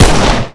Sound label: fire, glock; Urban-Coalition; Decay; arccw ud